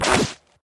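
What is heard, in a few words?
garbag attack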